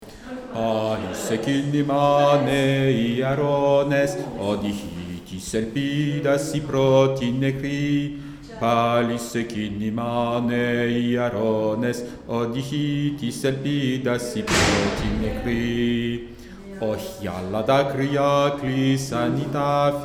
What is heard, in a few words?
basse